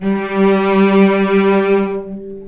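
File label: cellos attack G3 R, String; East West; The Ultimate String Collection; VCS ARCO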